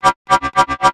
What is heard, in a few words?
sound design effect chopper metalic synth; sound effects one